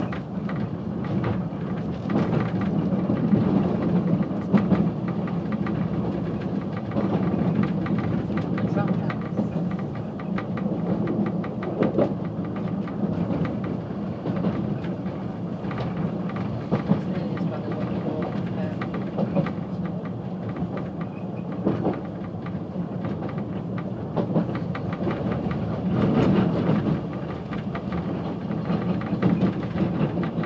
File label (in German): bahnfahrt klassisch